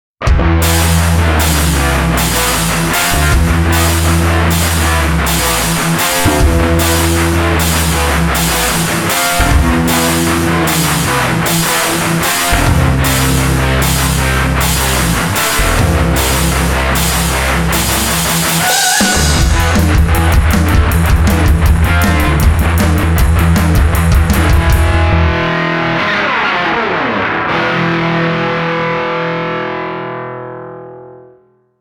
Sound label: metal rhythm